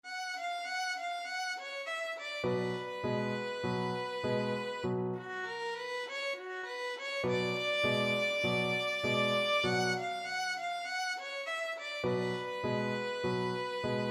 boogie violin

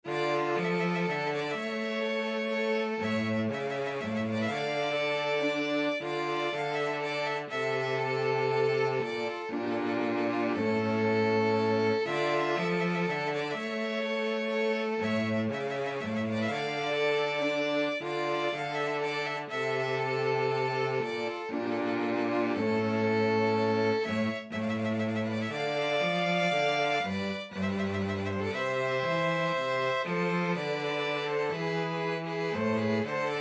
pastorale 2 violins cello

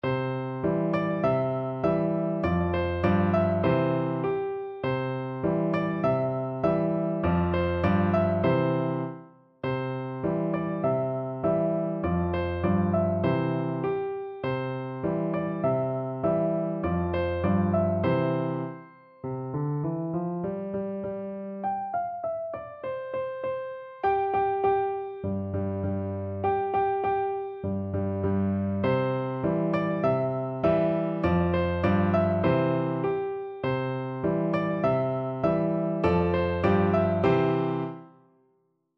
piano, piano duet

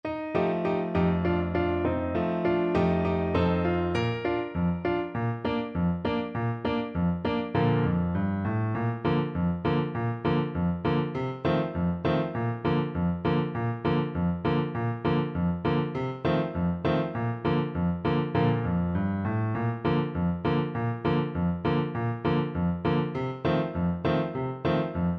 trumpet

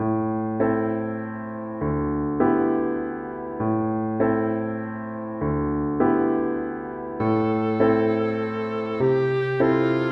gymnopedie 3, viola; satie

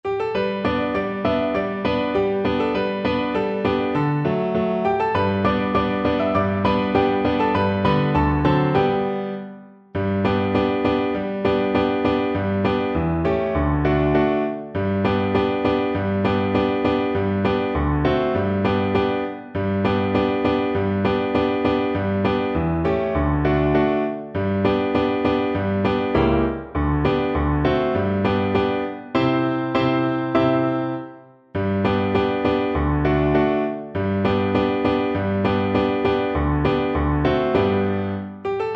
Alto Recorder

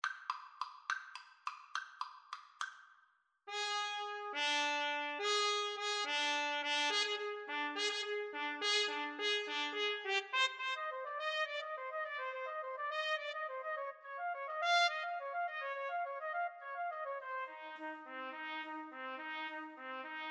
trumpet duet

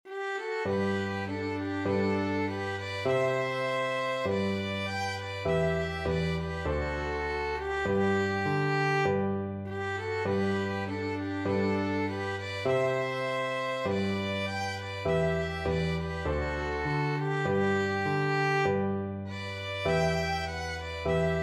sally gardens, violin duet

